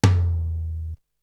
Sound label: Tom, Drums; ZERO G - Datafile DISC 3